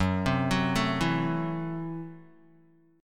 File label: Gb Minor 7th Flat 5th, Guitar; arpeggiated; JGuitar